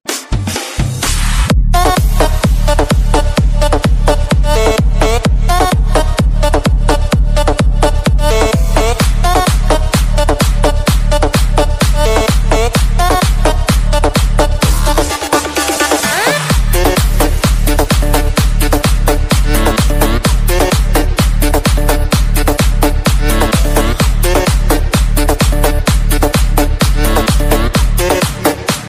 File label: cool ringtone